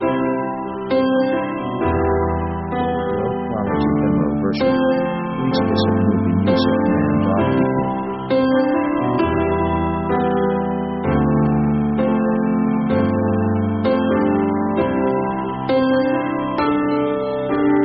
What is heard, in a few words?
piano 65bpm lazy; orchestra themes